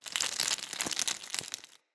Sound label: Impact Burn Medium Flesh; Weapon Impacts